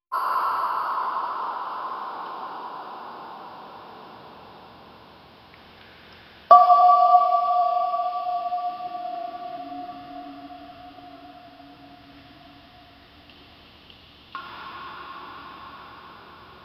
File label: create an atmospheric cav, cave